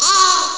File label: eeee, Amiga Soundtracker; ST-XX Amiga Soundtracker Sample Packs